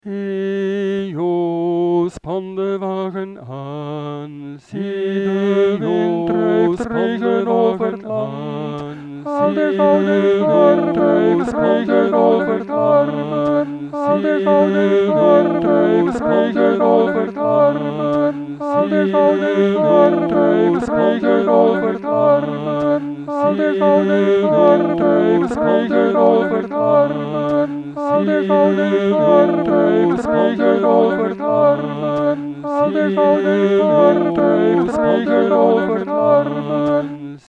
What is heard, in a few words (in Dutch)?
muziek herfstliederen hejo span de wagen aan zang driestemmig canon